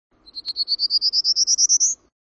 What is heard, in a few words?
Prairie Warbler; 02-PrairieWarbler spectrogram